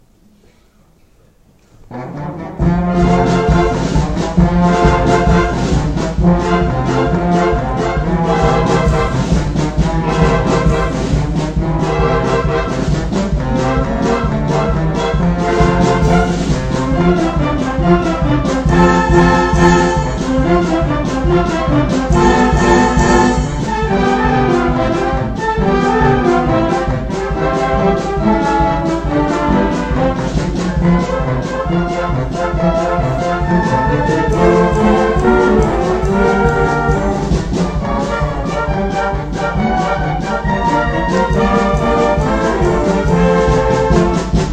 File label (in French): Harmonie; Barnum Circus; Voeux Araches